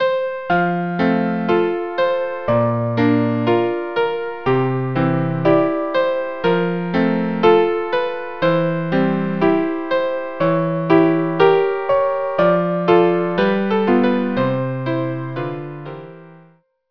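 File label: string quartet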